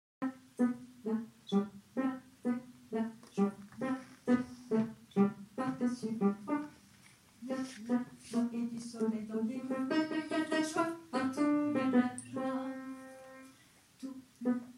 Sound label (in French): alto hommes